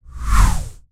Swoosh Transition

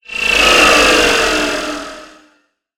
Sci-fi Transition

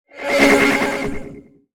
Oscillating Sci-fi Transition

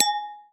wineglass clang

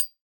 Glittering Clink

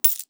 Coin Drop Clink

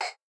synthetic puff

